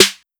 808 Snare; Slate; Vintage Drum Machines Volume 2 808 Edition

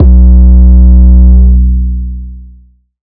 808 Bass Multi B3; Slate 808 Deep Dive; SD Signature 808s

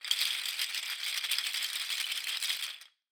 rattles Roll, Raw Perc One Shots

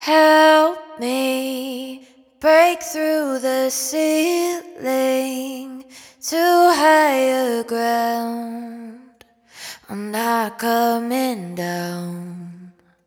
hook Not Coming Down 125 Fm wet, Vocal; Slate Digital Omnivox Vocal Sample Pack

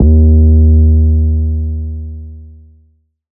808 Bass Hype Eb3; Slate 808 Deep Dive; SD Signature 808s

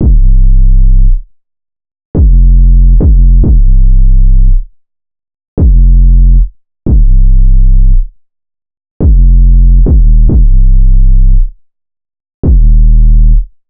808 loop 140 Cm; Slate Digital BASSHOLE Sample Pack; 808 Loops

